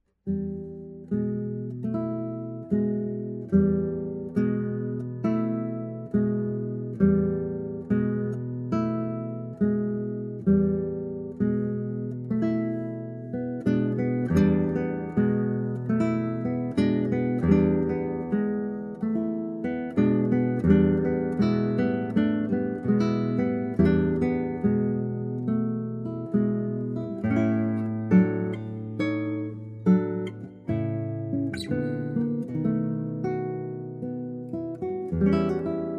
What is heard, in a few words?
prelude 2 with reverb